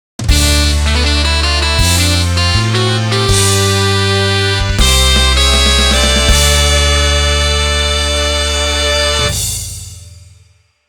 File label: Race Start Fanfare; Confirmed Kart Racing - OST